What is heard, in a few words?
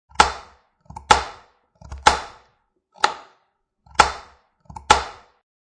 Chopping fresh, crisp, produce with sharp knife on wooden cutting board